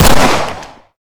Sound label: fire, glock; Urban-Coalition; Decay; arccw ud